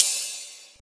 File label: Ride; FLPRO